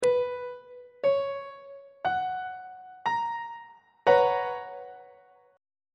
a double sharp major 7th suspended 2nd chord on treble clef